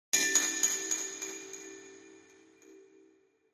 Chinese Chimes Bells

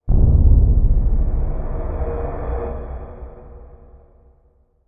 Bass Impact Eerie; impacts

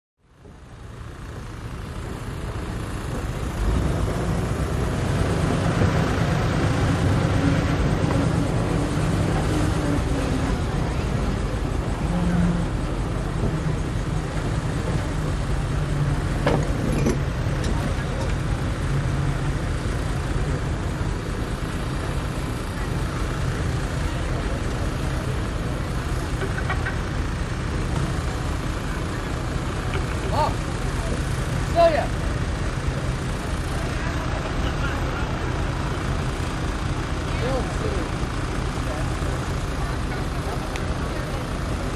Boat Race Pit Doc Amb, motorboats

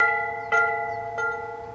Bell Buoy Ring

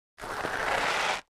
Object Dragged Acros, rocks & dirt

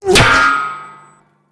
Bullet with Whir Impa, metal

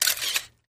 35mm Camera Auto Win; shutters & movement

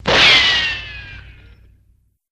Ricochet, bullet bys & riccos

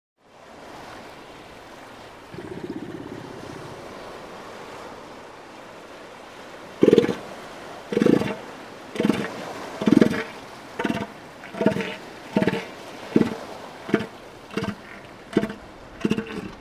Seal Elephant Growl

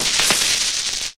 Welding Torch Sizzl; torches

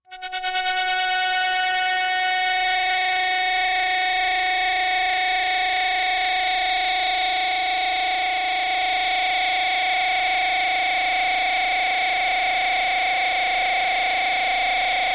Alarmish Machine Alar; alarms

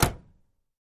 Camaro Door Close, chevy camaro

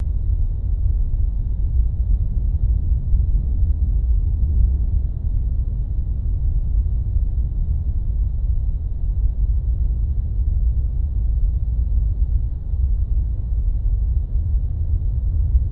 Earth Winds Loop, earthquakes

